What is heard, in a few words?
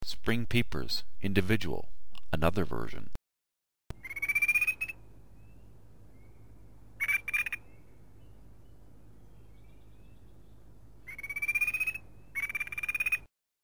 peeper